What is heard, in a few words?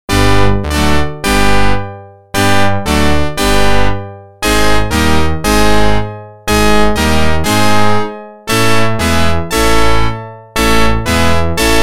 Organ